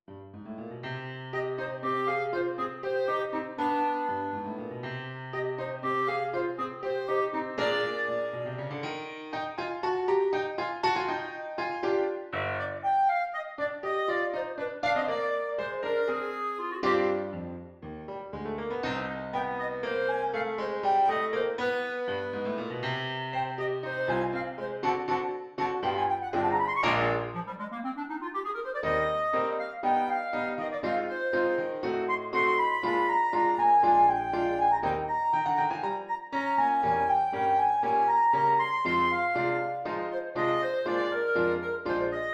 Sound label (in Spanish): swing y tango